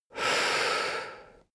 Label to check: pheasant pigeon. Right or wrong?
Wrong